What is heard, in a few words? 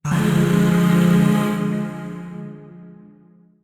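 Shawzin Minor Chord; Warframe; Zariman; Void Shawzin; Chords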